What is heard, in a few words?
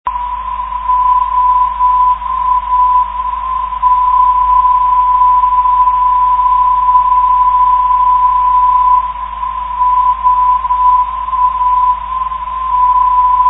342khz, ndb